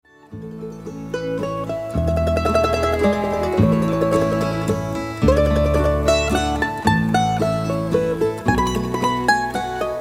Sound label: Azalea Waltz